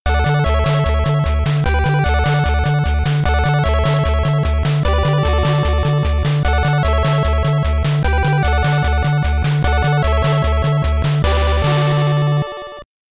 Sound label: Level Clear; NES